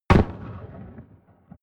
shotgun distant shot

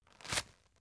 tissue take hard

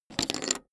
poker chip stack small fall down

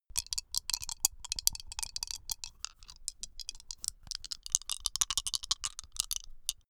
dice shake hands closed long

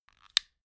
stick lighter button light up

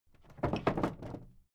old wood case wobble

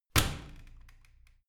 washing machine close hard

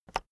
keyboard external enter release

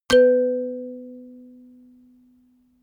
notification kalimba octave low

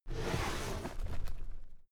drawer cabinet paper open heavy rustle

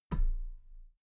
metal ladder step soft muted

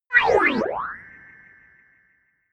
notification fly by synth

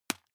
juggle catch medium

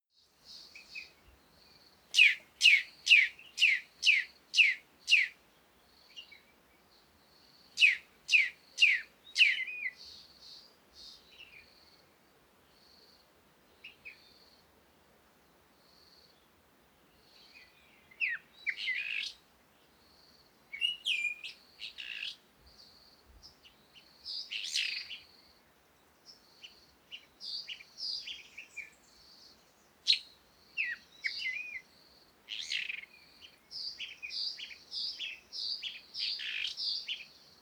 africa morning wind various birds; komati

